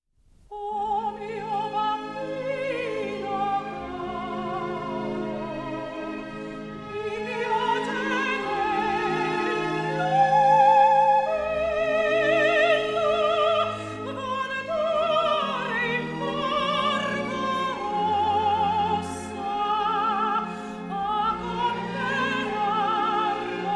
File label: vocal; Puccini; O mio babino caro